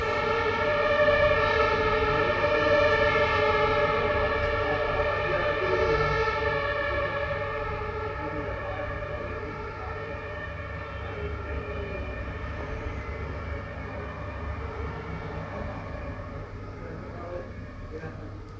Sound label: Distant Ambulance Fire Truck Sirens Germany